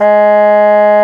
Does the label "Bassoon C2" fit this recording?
Yes